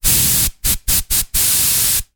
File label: industrial compressed air aerosol spray bursts in air; zapsplat